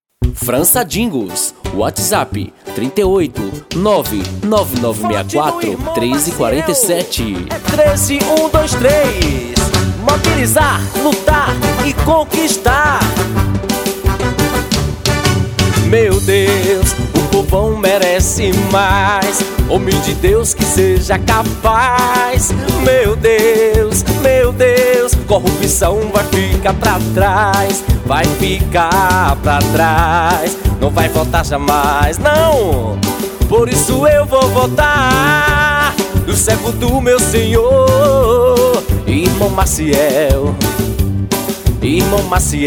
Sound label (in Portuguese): jingle gospel forro pisadinha; 2020 vereador prefeito vereadora; piseiro